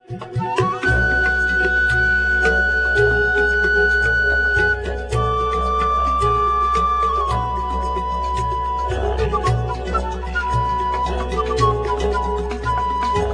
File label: muffel udu